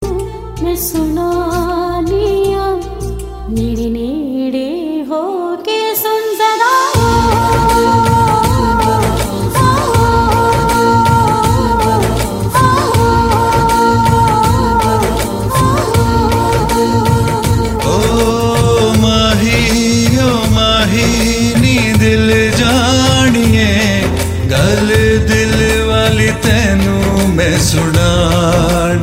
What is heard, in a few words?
Romantic